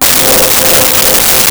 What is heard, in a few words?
Halo Pad C5; Nintendo; July 2020 Gigaleak